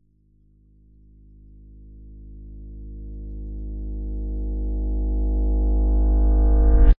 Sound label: riser single note low pitched reversed guitar pick; sound design